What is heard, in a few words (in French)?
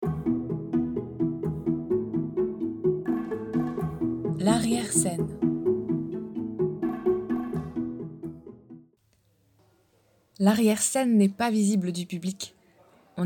Arriere scene; théâtre; podcast